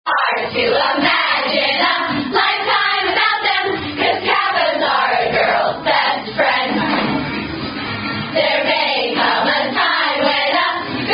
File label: Rush Song [Sorority Song]; KKG - Kappas Are A Girls Best Friend